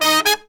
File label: 120BPM, Horns; 90 s Sample CDs; Zero-G; Phantom Horns